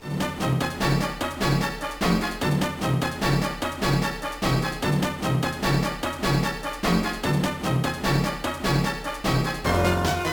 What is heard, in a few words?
Boss Fight; Spider-Man 3 2007 GBA; Electro, Venom Boss Fight